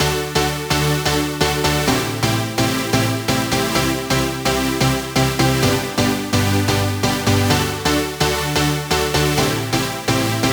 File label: 128 D Chords